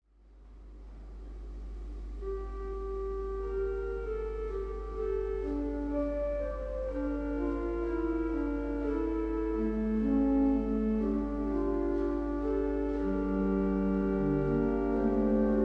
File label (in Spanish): bordón dulce con pedal, órgano